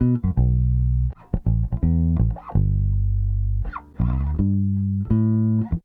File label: Soul Bass, 70s Analog Funk